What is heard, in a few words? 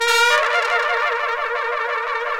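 trumpets FX, Brass; Roland L-CDX-03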